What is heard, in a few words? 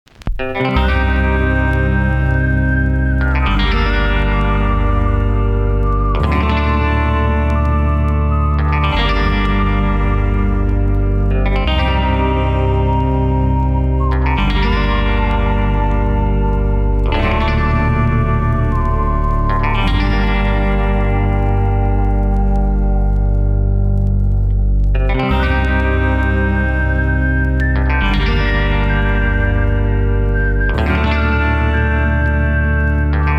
Death; Dust Neon